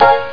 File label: collectable; Amiga Games Extra 1996 #6; harrysballoons; sonix